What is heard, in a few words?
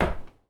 dart hit; Darts; Darts2Go; DartsToGo